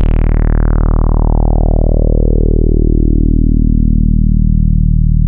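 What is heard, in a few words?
MOOG MODULAR D#1; Hollywood Edge - Giorgio Moroder Rare Synthesizer Collection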